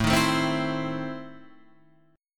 E Major 7th Suspended 4th Sharp 5th A

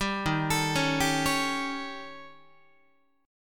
Eb 7th Flat 5th Eb down Guitar; Eb7b5 arpeggiated; JGuitar

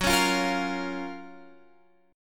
F sharp Major 7th, guitar; chordshape; down

